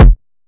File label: kick clip